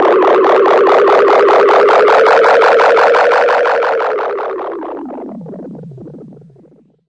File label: blurble wind down; Various Strange Sounds and Effects